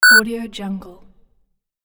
bleep

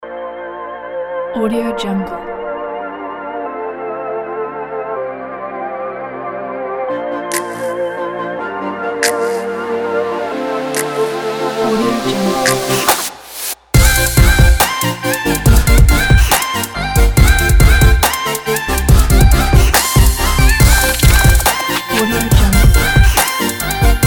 the future bass

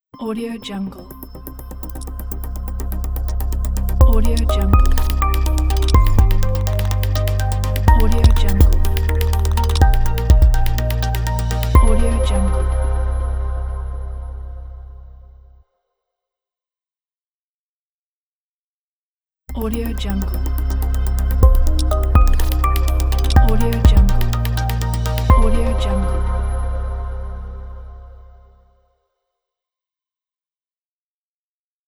digital elegant logo

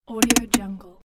applying handbrake

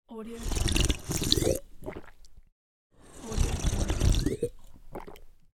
drinking

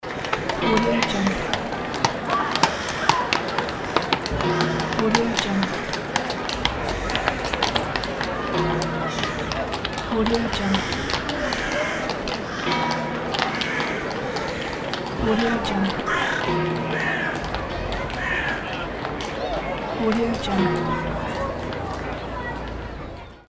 medieval town ambience; mega-loop

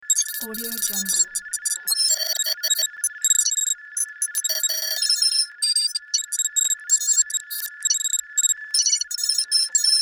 cybernetic device; alexsandal

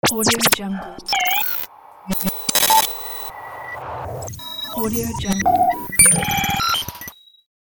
digital fx interference

